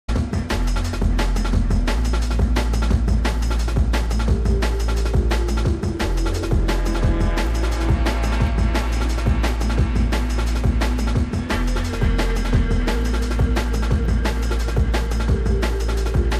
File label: Drum and Bass Jungle and Old Skool, UK Bass Radio